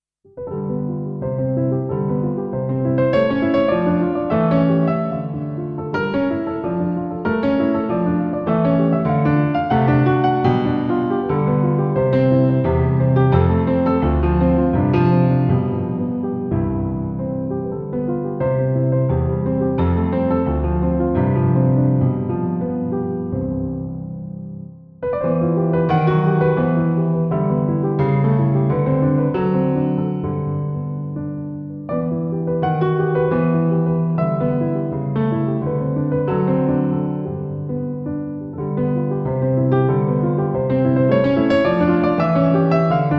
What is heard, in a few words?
Studio Grand